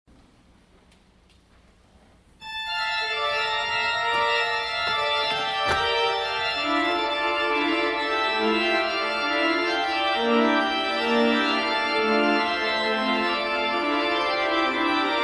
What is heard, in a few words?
Toccata